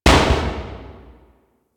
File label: Destruction Short Metal Hits; Ambience; Warframe; Corpus Railjack